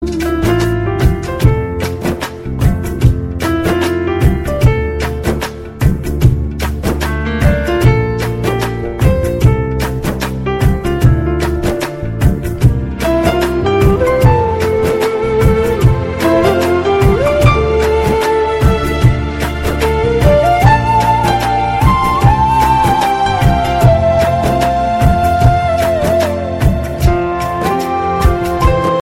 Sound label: instrumental